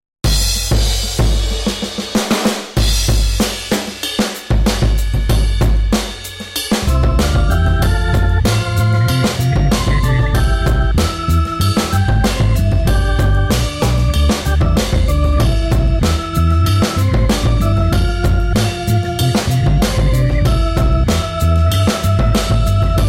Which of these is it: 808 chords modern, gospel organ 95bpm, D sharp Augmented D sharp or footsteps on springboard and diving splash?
gospel organ 95bpm